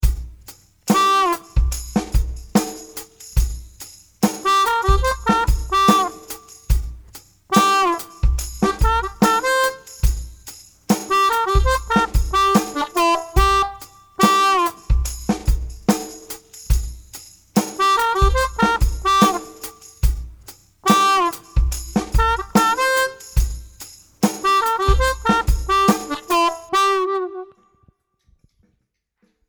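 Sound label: medium riff, harmonica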